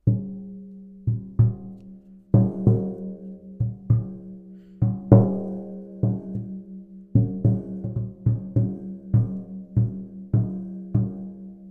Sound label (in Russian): buben